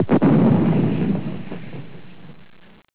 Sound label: big Far